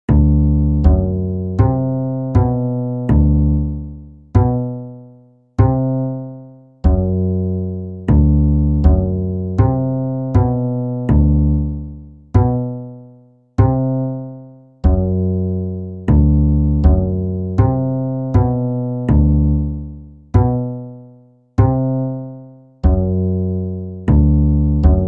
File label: Bass block